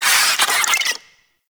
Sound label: Infested Ashen Vocal Attack; Warframe